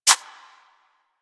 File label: Rodent Step Dirt, Forest Rodent Footsteps; Characters; Foley; Warframe